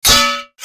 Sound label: sword vs shield